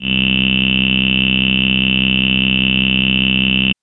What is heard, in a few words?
Synth VoiceEe c1; TrashLibrary